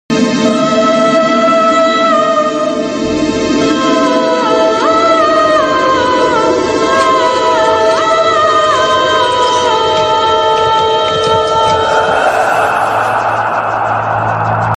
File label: horror song; bhoot